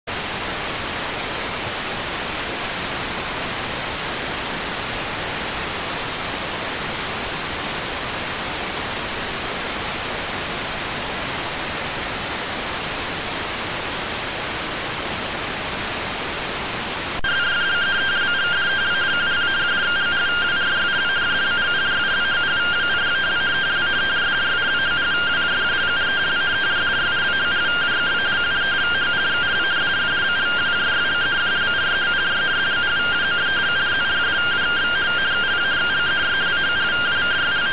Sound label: rtty rx